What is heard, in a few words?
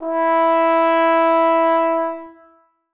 tenor trombone e4; Sonatina Symphonic Orchestra